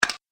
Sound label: Robot Eye Movement, sci-fi robot sound effect; Andrew Fleming; Twistedtracks